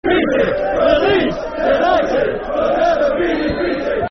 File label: Football Chant (Soccer Song); We're Leeds United We'll Never Be Defeated; Leeds Lufc